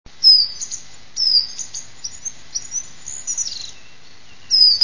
goudhaantje

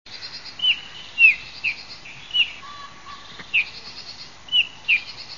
goudvink

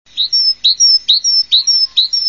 koolmees

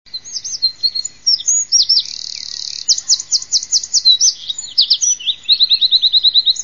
winterkoning

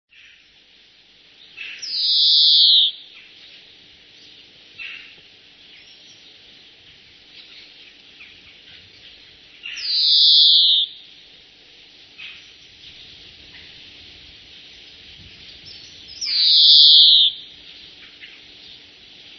groenling

